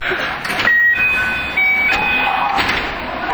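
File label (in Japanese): ドア開け, 221系 体質改善車, ドアチャイム設置車両; JR西日本発足時に製造。京阪神間新快速のレベルを引き上げた。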